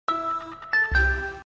tone

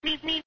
Road Runner Beep, ringtones

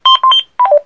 MotoTRBO Detach, radio effect

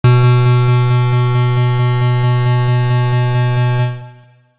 warning

